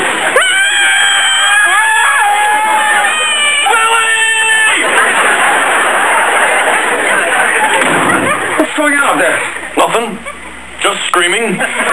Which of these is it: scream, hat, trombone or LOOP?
scream